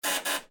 Trolley Coin Buzz Box

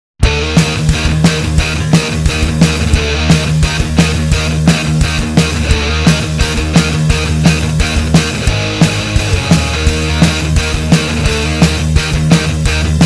70's Punk Rock; Soundzabound Volume 5

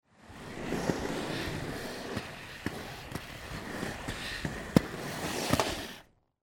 skateboard

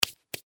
Wipe Hands

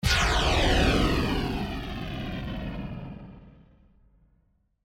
Sweeper Burst

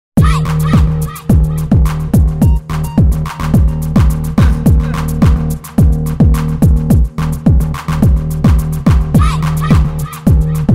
Urban Island; Soundzabound Volume 5

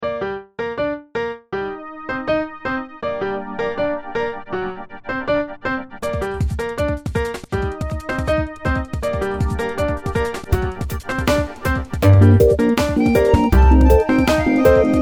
Latin Dub

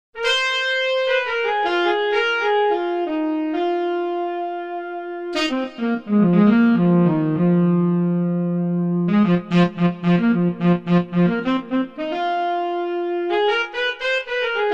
Sax Solo; Soundzabound Volume 1 Disc 3

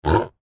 pig oink

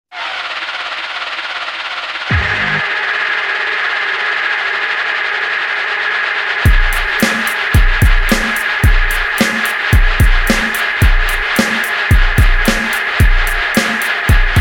Pop Princess, no vocals; Soundzabound Volume 3

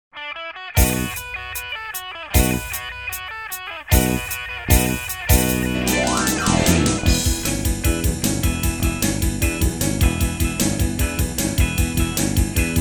Boogie Woogie; Soundzabound Volume 1

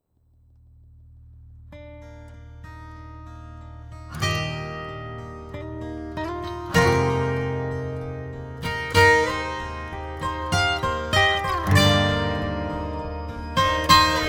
Acoustic

